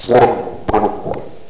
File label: drown; quake2; dung